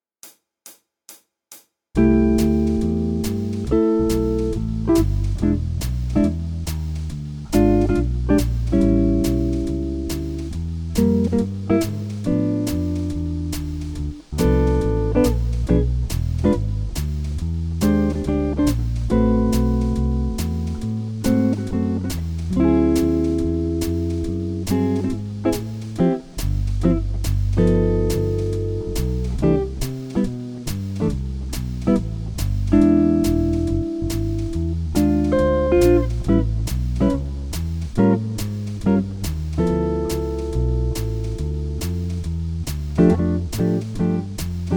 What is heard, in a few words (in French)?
walking, walking bass